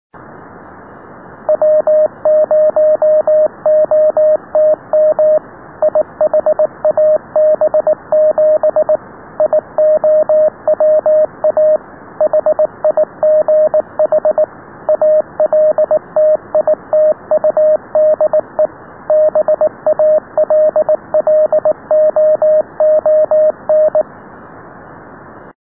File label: Beacon Sample; iHAB-7